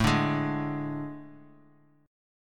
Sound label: A Major 7th Suspended 4th; AM7sus4; strummed; JGuitar